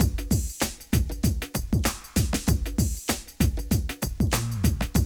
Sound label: break; Zero G - Man Machine